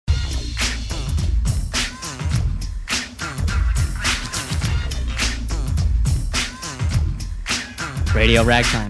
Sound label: hiphop